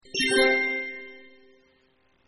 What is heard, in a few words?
end scene target reached star; Delicious Emilys True Love